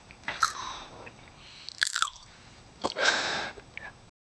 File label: gentle munching of crunch, fries